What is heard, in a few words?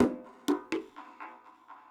PERCUSSN ETHNO 125, Drum